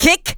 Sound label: kick; PaRappa the Rapper (1996)